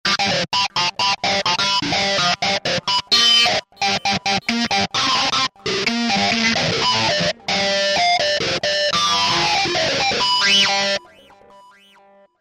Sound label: Dirty Feed, Roland V-Synth; Factory Patches